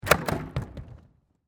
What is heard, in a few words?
Gymnastics Jump, Sports, Gymnastics, Spring, Board, Jump; Miscellaneous Sports